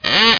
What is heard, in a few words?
slider; Microsoft Graphics Studio Greetings; Microsoft 1998